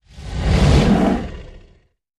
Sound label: Animal Sting Growls; stingers & whooshes